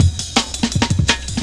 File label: jazz ridez cut, drum loops; Zero-G Jungle Warfare 1, 2 & 3